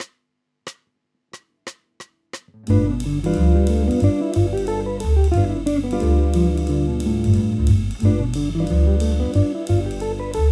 Esercizio G7 in posizione di D in drop 2, guitar

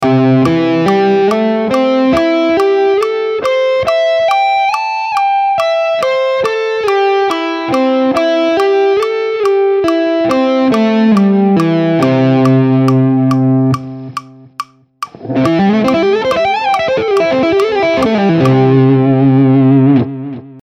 Triade M + Slide M6

